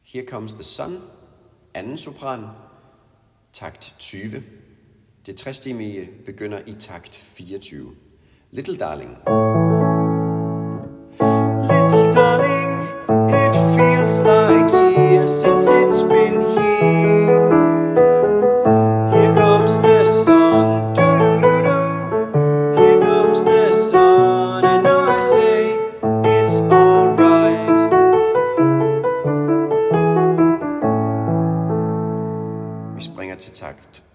sopran, kirkens folkekor; laudate

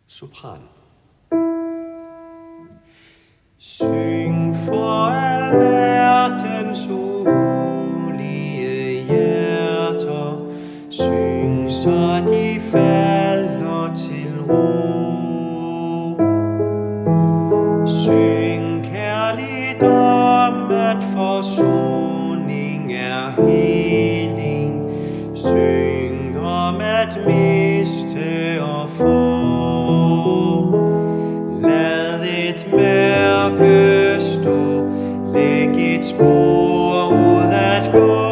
Syng for alverdens sopran; laudate kirkens folkekor

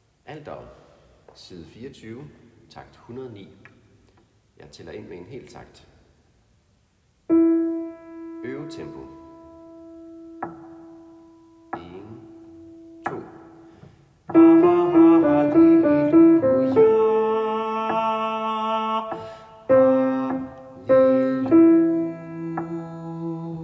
Alleluia synkober; laudate kirkens folkekor

